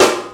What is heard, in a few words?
old school dark sounding snare drum f sharp key